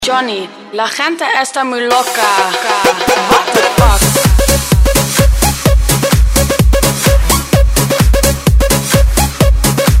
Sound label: remix